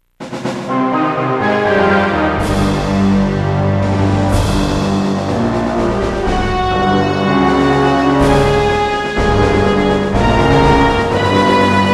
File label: gunfight; shambala gunfight; Indiana Jones and the Infernal Machine